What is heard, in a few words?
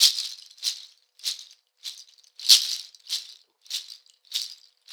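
shaker, Synclavier Percussion, World & Orchestral; ILIO; Synclavier Percussion 2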